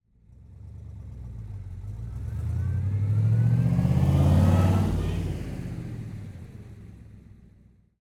bigblock corvette 1967 driving passby reverse whirring burst quick; 6ftheight; ortf; sonniss gdc